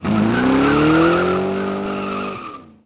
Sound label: engine rev